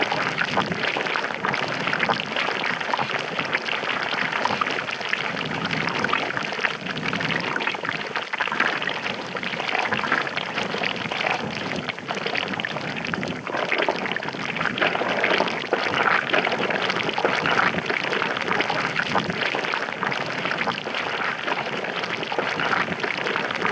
lava bubbling; Level4 Hidden World

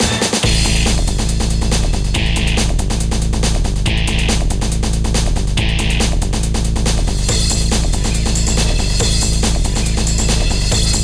Jingle Live menu; PS Vita

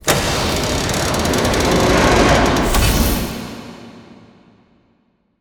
Field Mat Tap; Yu-Gi-Oh! Master Duel

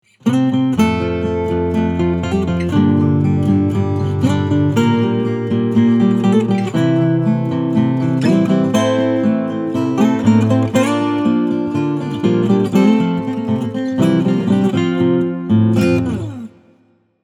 Title Theme (Acoustic Short); Donkey Kong

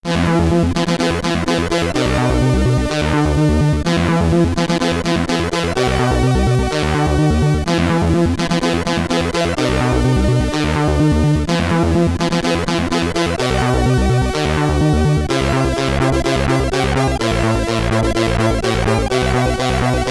Ending SID Stereo

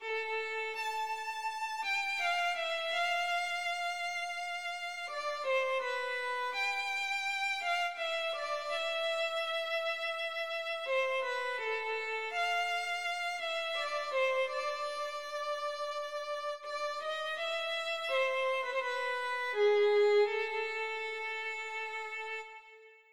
Violin Solo; stardew valley original soundtrack 2018

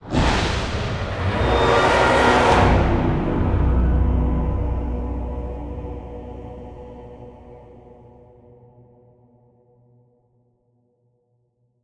String; Middle-earth Shadow of Mordor

